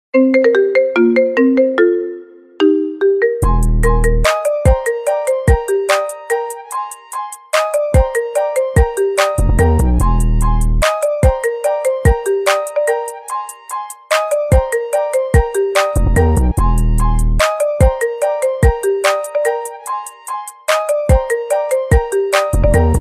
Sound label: marimba remix